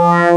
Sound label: ppg wind syn, wavetables